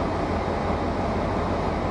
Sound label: Pielstick idle; finnish